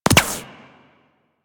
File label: Shield Impact